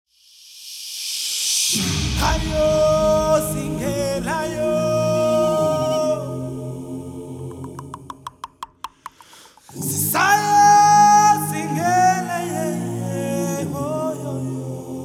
Youth Choir

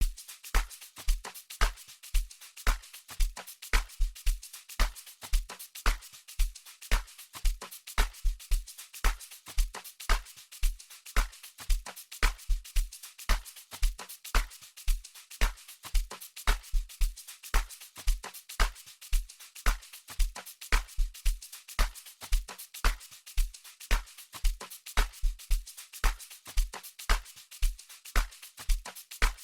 Saxy

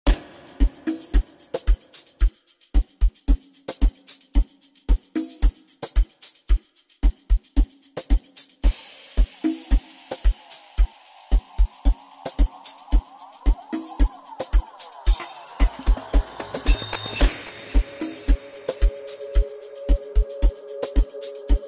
Bells

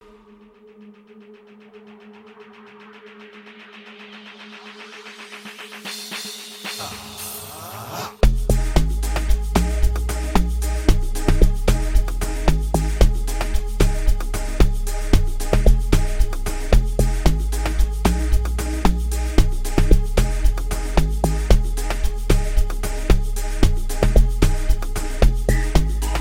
Deeper Soulful Sounds

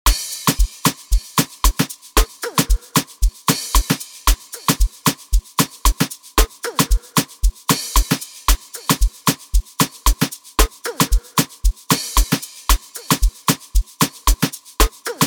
Amapiano

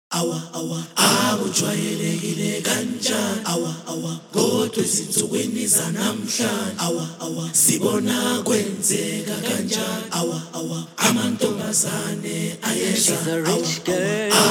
Youth Choir

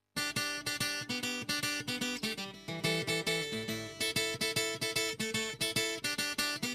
Maskandi Maskanda Song

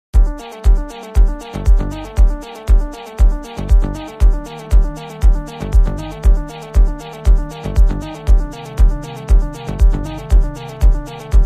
AfroTech Remix

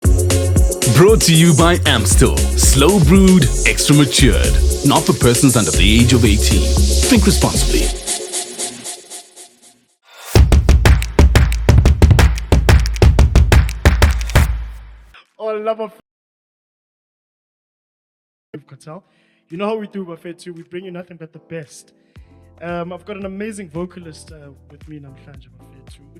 Amapiano Mix

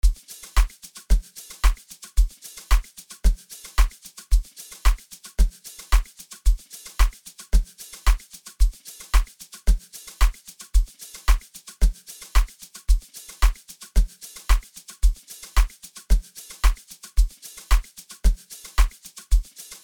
Tech Mix

